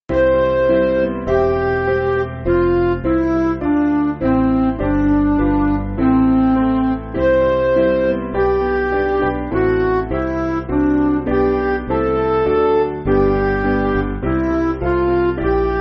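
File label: Midi, Music Backing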